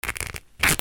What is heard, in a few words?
RIPPING